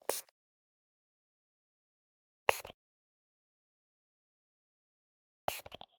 applying the nasal spray; human; NS Studios - ultra sound collection

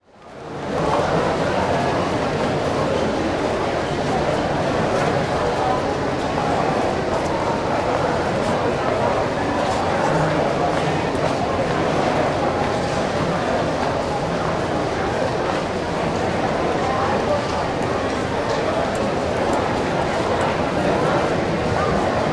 Crowd Indoor Large Gallery Museum, Walla; Sound Ideas; Walla Series

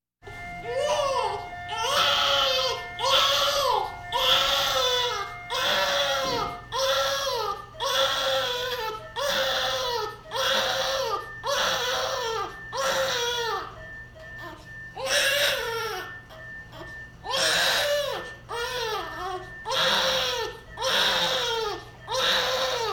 Hospital effects

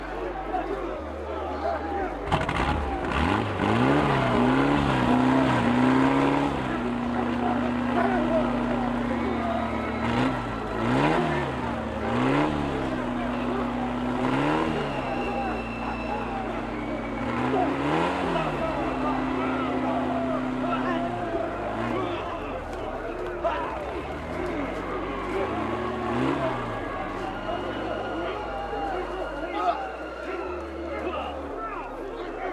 motors screams; mother cone; Blind Drive

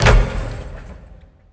Menu Basketball; Menu FX

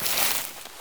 grass step, footsteps

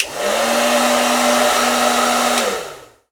Blow Dryer, Bathroom; 1001 Sound Effects

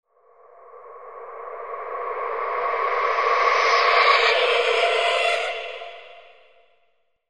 Scary Girl; horror sounds; Hollywood Edge

